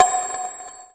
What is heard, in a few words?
Skill Effect; MilkChoco